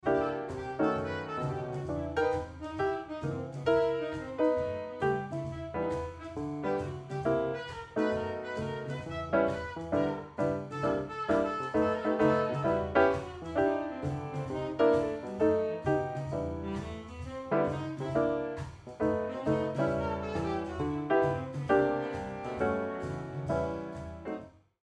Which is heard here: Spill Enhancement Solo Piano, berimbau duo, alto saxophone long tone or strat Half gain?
Spill Enhancement Solo Piano